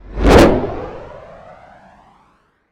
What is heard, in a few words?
enemy Away; Space Wave Race